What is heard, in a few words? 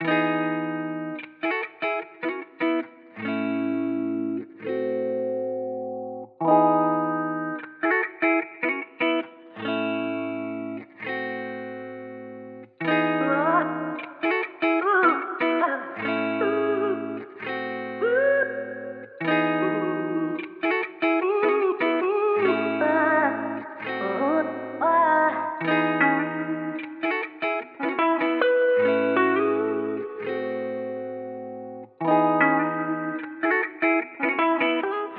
150bpm (E Major)